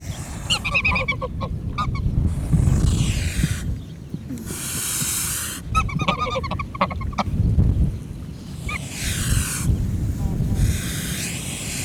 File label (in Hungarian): sziszegő gúnár szeles idő, fodrostollú magyar lúd; Hortobágyi Nemzeti Park 2013